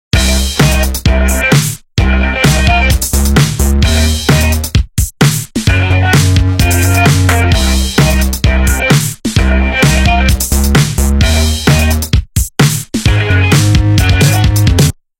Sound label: Radial, ringtones; Nokia; 5130 XpressMusic